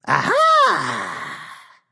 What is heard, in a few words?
nv discover ah; smite; voicepack; ganesha